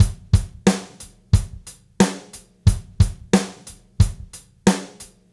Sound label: Gretsch Groove 90, 1967 Gretsch Kit, 90 BPM, Drums